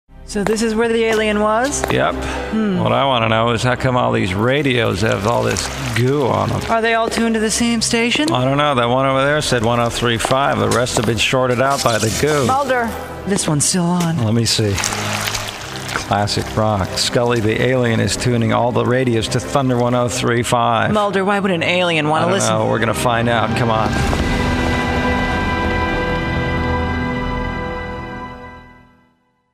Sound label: Classic Rock; X Files